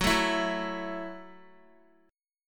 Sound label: Gb Minor 7th, Guitar; Gbm7; strummed; x,9,7,9,6,x